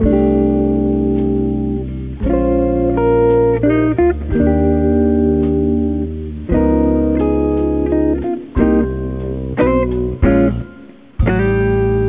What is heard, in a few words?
hold music